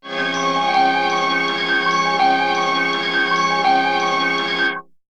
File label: Melody loop; ZERO G - Datafile DISC 1